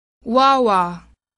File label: wah-wah